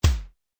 kick

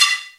Flute, Yamaha TG-33; Drum Samples

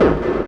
Hard Kick

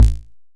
kicks, Bass Drum

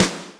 snaredrum, Yamaha RM 50

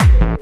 Kick n Bass

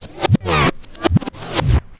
Vinyl; Sound FX

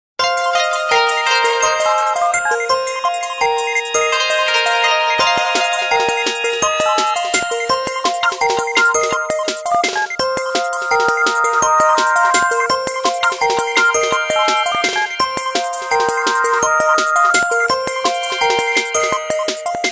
Japanese videoringtone; Nokia Ringtones; Audio themes

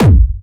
kicks

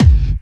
Soft, kicks

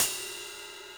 CYMBAL, Yamaha RM 50

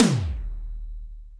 Yamaha RM 50 Toms

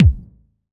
kik, Bass Drum Kicks

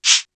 Cabasa, Korg T3; Drum Samples

